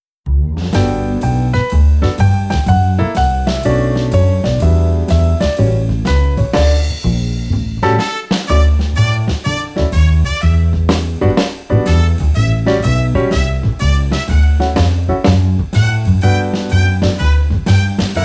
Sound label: Happy and You Know it instrumental